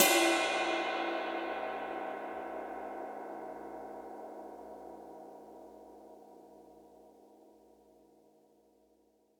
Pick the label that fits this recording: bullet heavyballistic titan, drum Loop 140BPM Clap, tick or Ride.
Ride